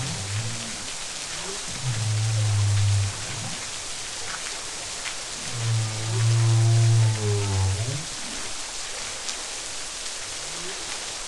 Tonal Call

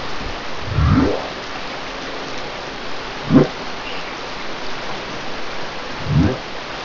Social Sounds Thwop Type and whops

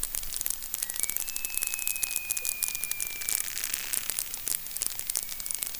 multipart stepping tonal downsweep rattle

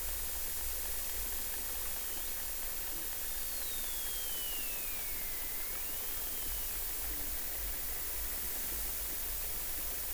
Lagenodelphis hosei down-sweep

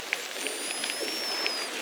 Orcinus orca

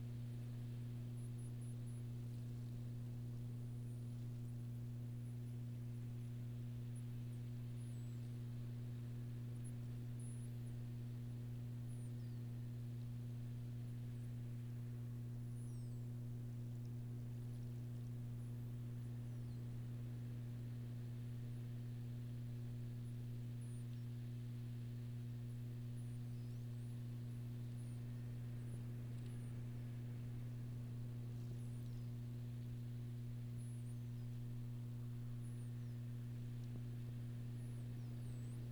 Striped Dolphin whistles

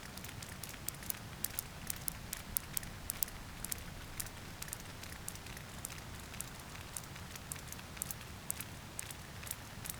Physeter macrocephalus clicks

